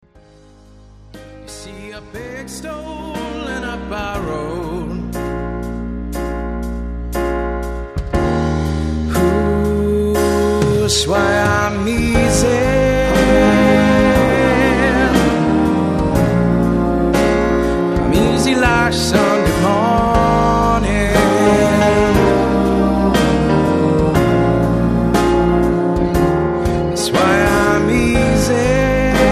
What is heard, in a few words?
Bassline